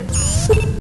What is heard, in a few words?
Invisible